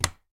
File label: flower click; tuxpaint; magic